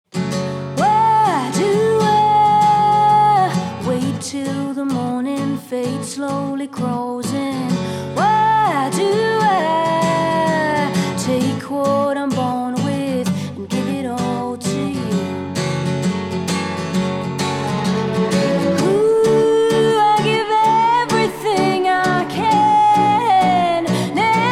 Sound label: Orchestral Version; Amazon Original